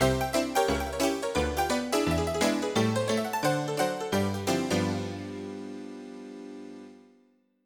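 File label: honky tonk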